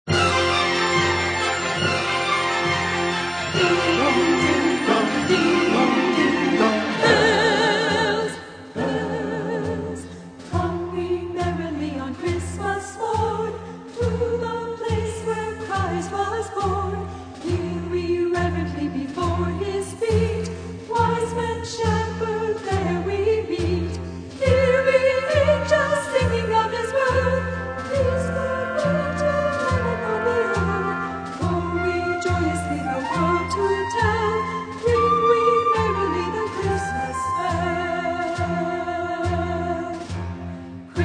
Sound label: Chorale And Orchestra, Christmas Bell